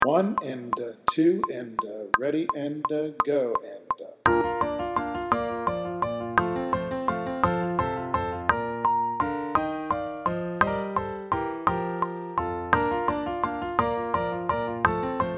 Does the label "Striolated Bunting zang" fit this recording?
No